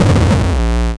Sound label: 8-bit